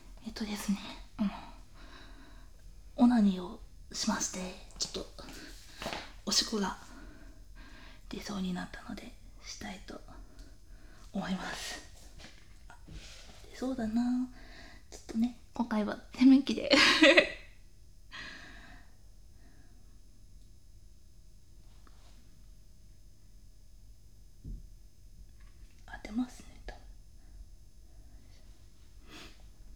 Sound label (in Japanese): 室内 洗面器 ステレオ